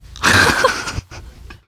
laughter, teen girl

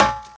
metal, footsteps infected walk; player

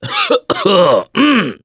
cough, mechanic; voice

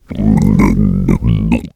become alert, infected; npc, alert, becomealert

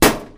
metal computer impact hard; physics

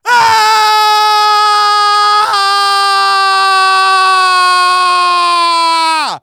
fall, survivor voice

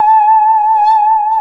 saw danger, music, zombat

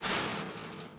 chainlink, footsteps walk; boomer

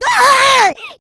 rage, infected, female; npc, action

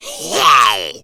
smoker spot prey

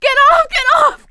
scream while pounced, survivor voice; producer

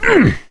melee swing; survivor; gambler

